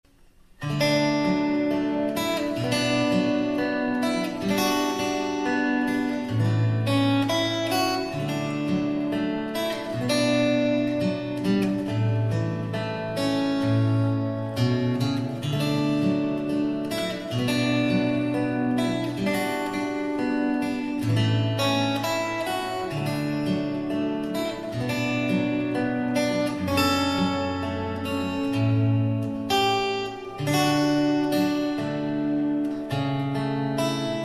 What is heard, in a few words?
fingerstyle